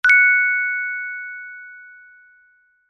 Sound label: multimedia notification bell chime ping long decay